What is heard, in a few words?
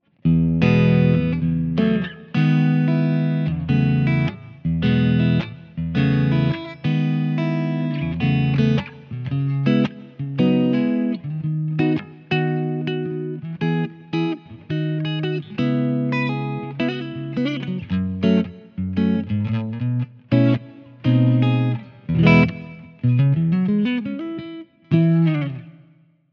Jazzmaster Amp clean